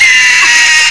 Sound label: vulture